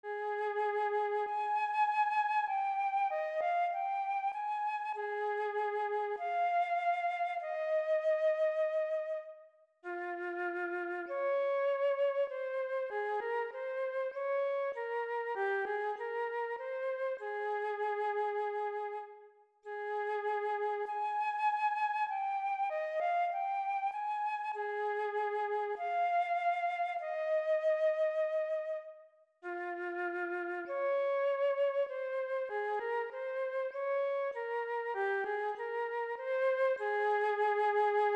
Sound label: in Ab, flute solos